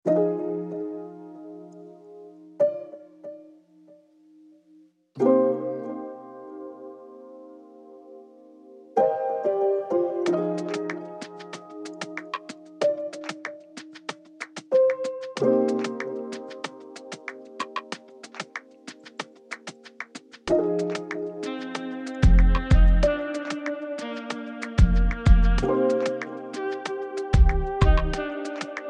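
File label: C minor 94 bpm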